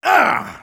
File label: Engineer negativevocalization, de